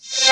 VOC HIT, VOCODER; Zero-G Ambient 1

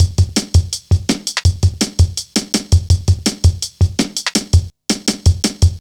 Foot Tapz, Drum Loops 165.5; Zero-G Jungle Warfare 1, 2 & 3

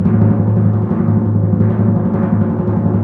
PRC TIMPRO, Timp Roll

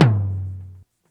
Tom Real Long; Lost Tapes From Mars

